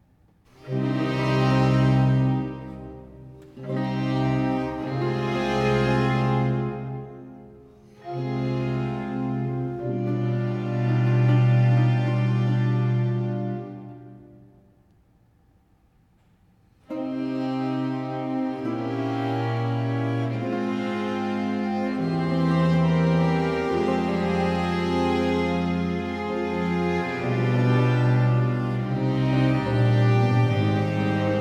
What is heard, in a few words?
Concerto 2 in F major 4. Grave - andante largo; Arcangelo Corelli; Corelli - Complete Works; Klassika